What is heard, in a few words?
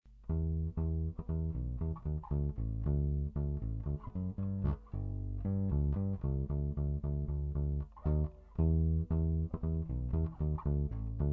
Gibson EB4L, bass